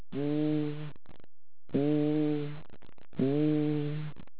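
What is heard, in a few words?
normal, pulmonary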